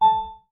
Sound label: Class Harp; Realme 8